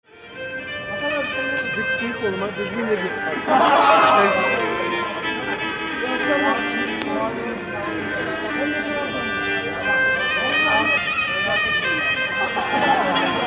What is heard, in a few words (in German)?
Istanbul Straßenmusik